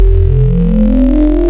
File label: Retro Resonant; Ableton Live 12 Lite; Vector; Sprites